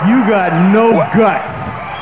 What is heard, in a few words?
sting